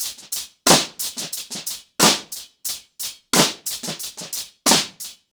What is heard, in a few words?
90BPM, ACOUSTIC DRUMS